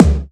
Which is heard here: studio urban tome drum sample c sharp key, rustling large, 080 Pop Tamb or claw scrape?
studio urban tome drum sample c sharp key